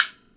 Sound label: Thunder Drum